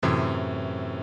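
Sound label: Gbm7b5; guitare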